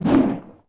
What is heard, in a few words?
Swoosh Weapon; Civ4 warlords